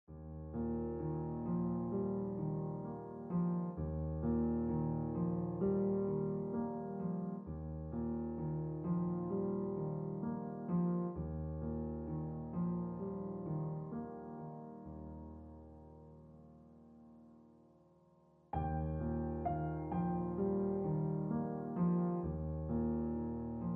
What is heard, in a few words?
Gentle Ringtone Piano Music